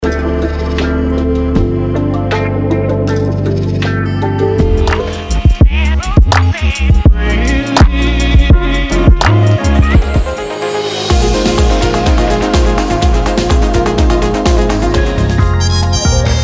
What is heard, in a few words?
Lounge